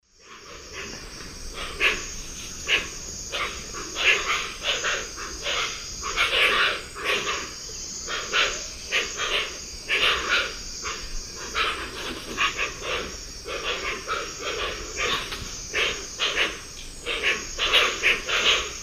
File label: Opisthocomus hoazin, calls; S. America; Eastern Lowlands